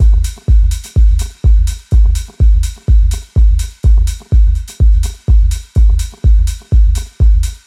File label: house techno beat passage, Fm, 125; drum loops